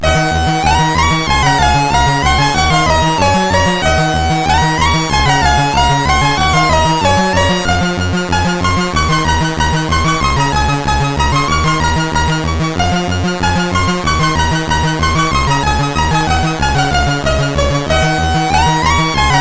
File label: sid CSG8580R5; Astrobot II